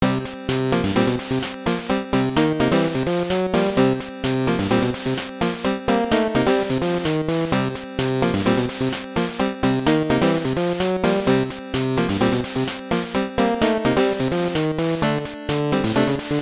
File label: Password Entry; Mega Man IV; GB